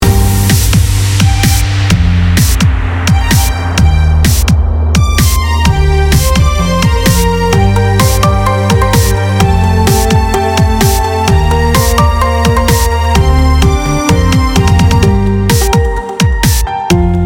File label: White Noise BOSS END